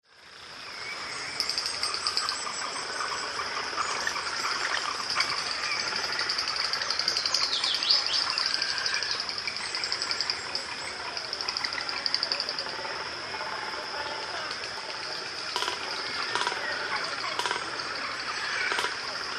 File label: frigatebird